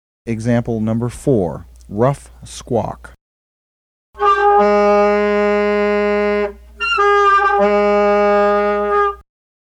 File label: Emb SAX; EmbouSure; saxophone